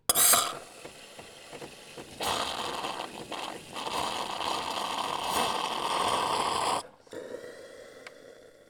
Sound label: Coffee Machine